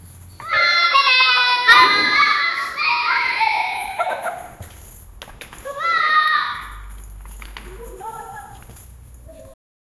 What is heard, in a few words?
two kids running on the